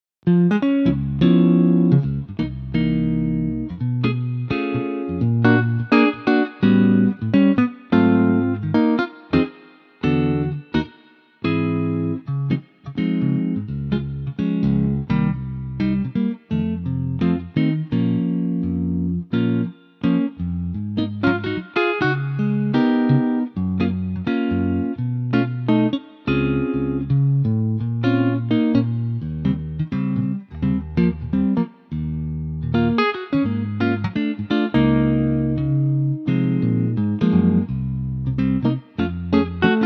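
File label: Db electric guitar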